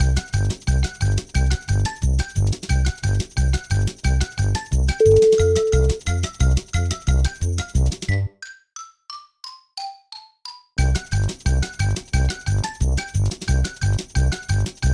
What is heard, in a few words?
funny chase